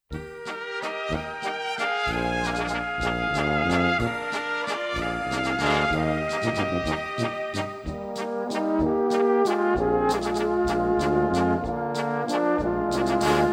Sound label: Instrumental